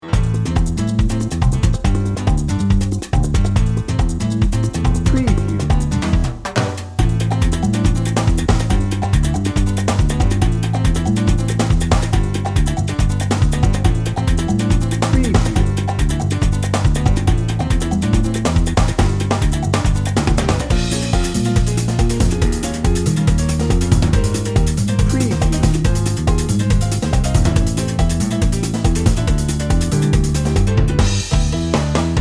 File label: salsa merengue madness no horns